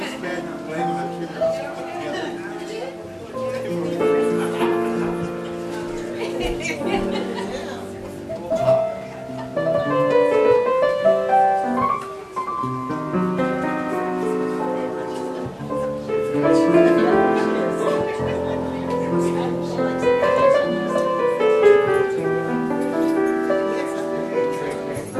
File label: Instrumental